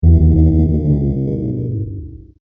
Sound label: Impact Growl; Miscellaneous Impact Sound Effects